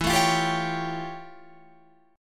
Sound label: F Augmented Major 9th E